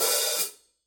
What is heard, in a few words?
formula 602 classic sounds 14 sound edge hi-hat open